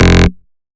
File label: Bass F, Bass Synth